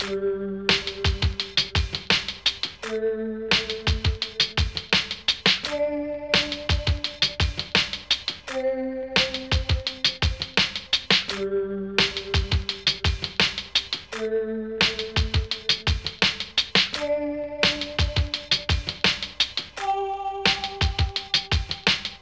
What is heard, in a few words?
chill beats; Worm Paint